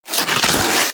Armadillo Roll Snow; Warframe; Characters; Hunting Critters

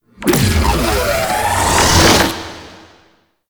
Drop Beam Charge Up; Enemies; Weapons; Warframe; Tau; Sentient Dactolyst

